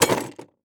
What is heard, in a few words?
Industry Tool Pliers Place In Tool Box